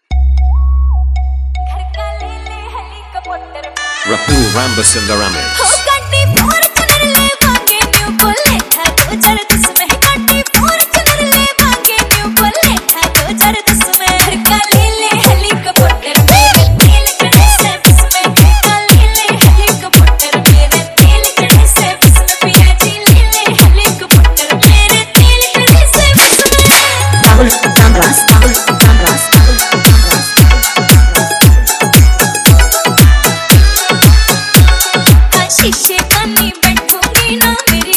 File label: remix